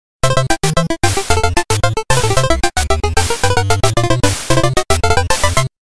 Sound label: 8bits; DuckHunt